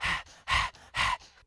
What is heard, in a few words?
breath